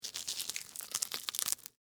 Sticky Rubber, Texture, Sticky, Short, Rubber, Gloves, Movement; Cleaning; BlastWave